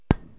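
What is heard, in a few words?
stroke, 9ball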